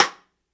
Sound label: snare rimward; one shots; SEISMIC Drum Sample Pack; Slate